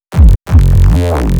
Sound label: Neuro Bass